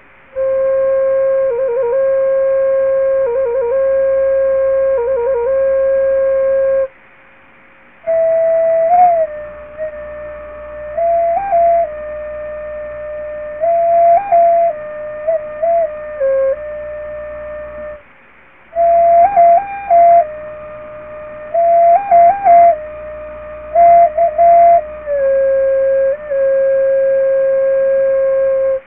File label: sioux dirge